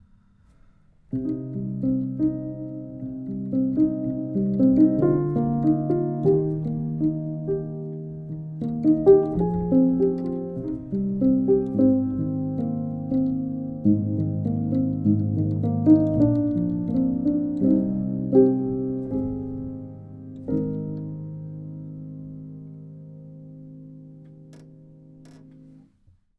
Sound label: upright, schoeps mk4